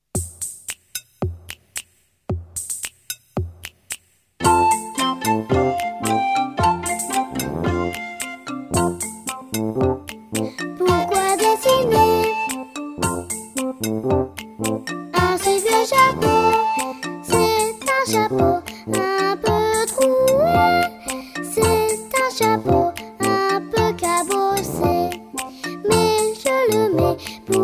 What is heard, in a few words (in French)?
Le monde en papier - 1ère voix seule; EcolesQuiChantent 1999-2000